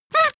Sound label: paper hoot loud short